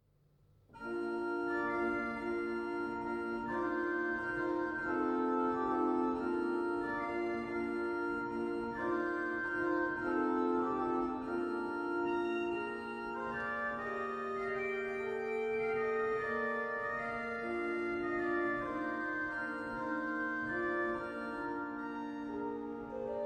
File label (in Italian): Pastorale Per Organo